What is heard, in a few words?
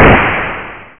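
anti-tank guided missile out; ATGM; TacOps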